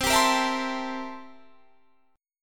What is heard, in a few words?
C 13th C down Guitar Standard; C13 strummed